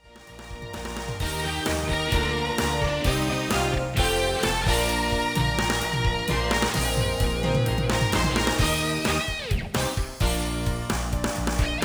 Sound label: instrumental into vocal tag